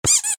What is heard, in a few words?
squeeze